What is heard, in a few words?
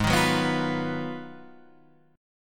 Ab Minor 13th, chord; Abm13 strummed; JGuitar